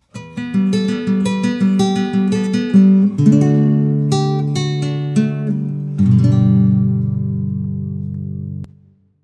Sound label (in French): impro solo guitare classique; loto sonore